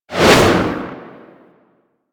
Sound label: Melee Slam Generic Whoosh; Warframe